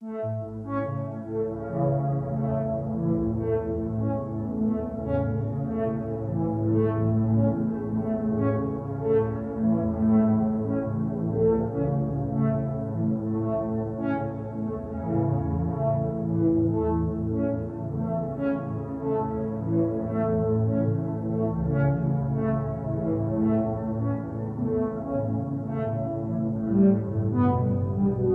Drums

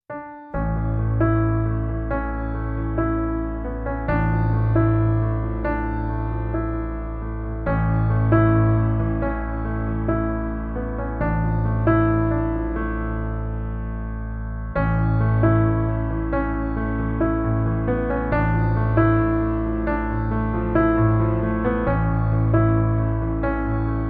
No Guitar

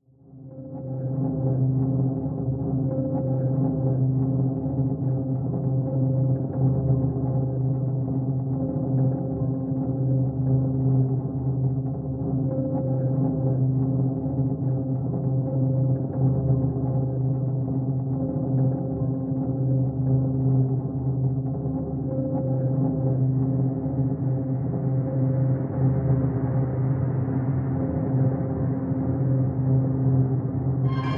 Evil Eye Ambient

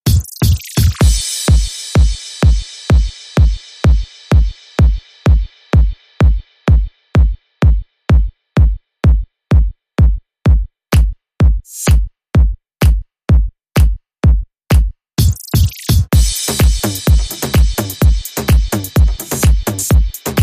Beat

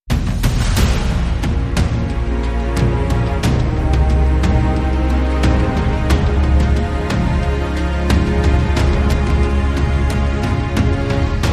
No Choir

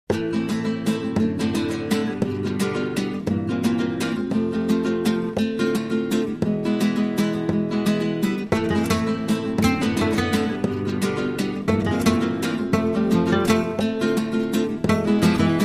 Sevillana

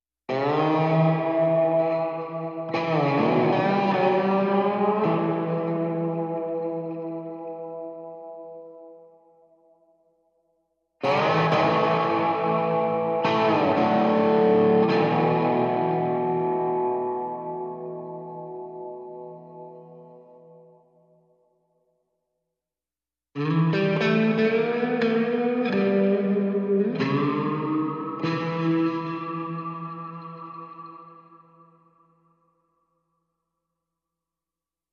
Eminor, Guitar Only

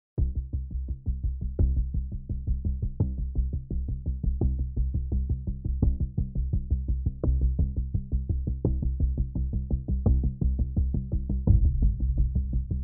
Dead End, Drums Bass and SFX Only

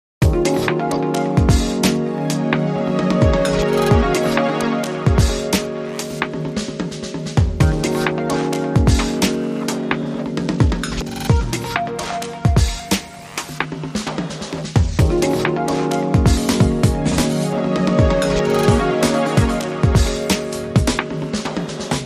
Bed

